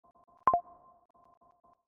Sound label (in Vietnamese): am thanh thong bao iphone ios 17; เสียงแจ้งเตือนไอโฟน iOS 17